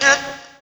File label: Fx Vox; Equipped Music; Loungin House